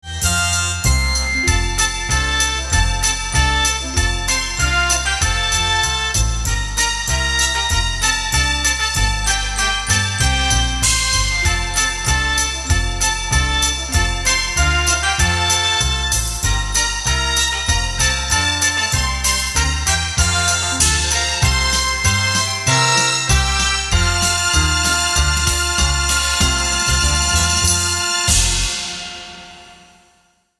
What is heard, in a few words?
JINGLE BELLS